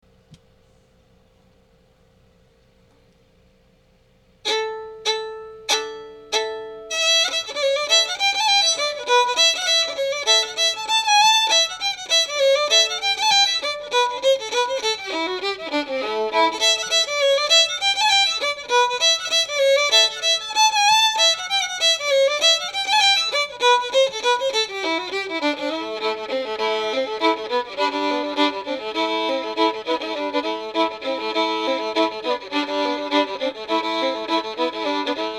growling, fiddle

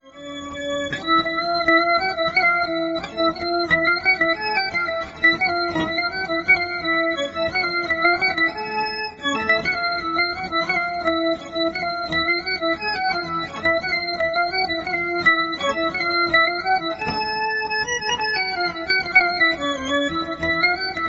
mazurka, fiddle

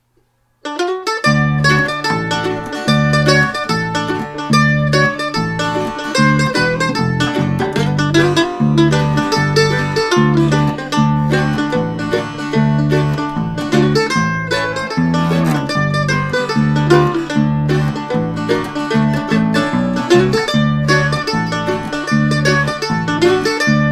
bluegrass

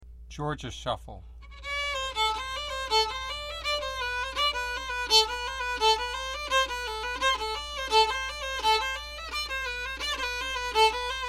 bow lick, fiddle

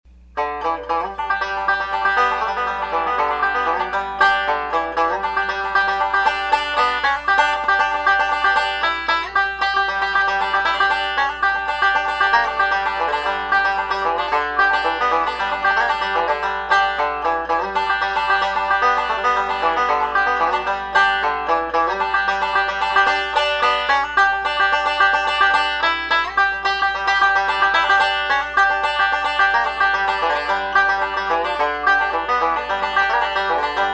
mic test, banjo

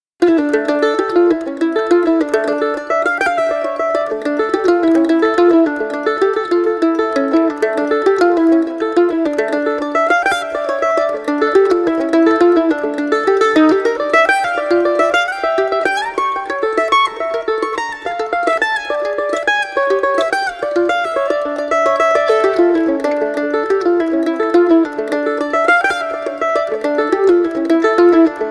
mandolin d

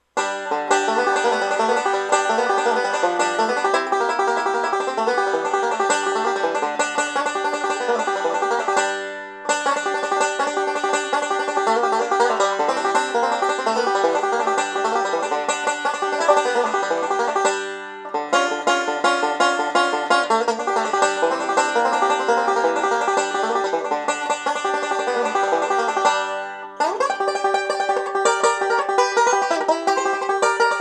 banjo

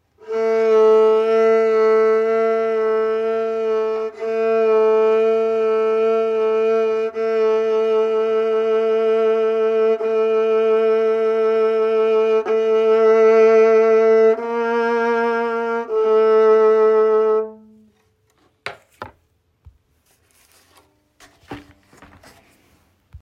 vibrato, fiddle

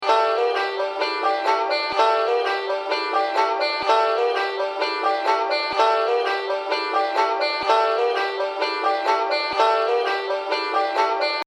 sally lick, banjo

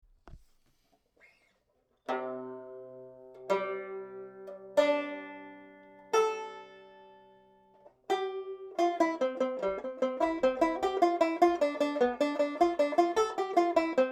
tenor banj

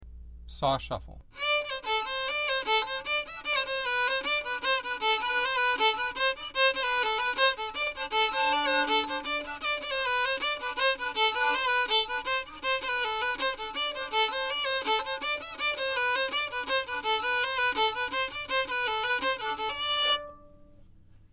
bowlick, fiddle

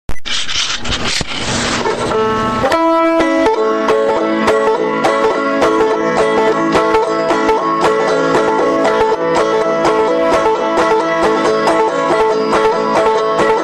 boom ditty, banjo

